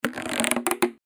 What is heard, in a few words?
rubberband tension